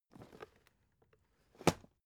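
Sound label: aluminium case small handle close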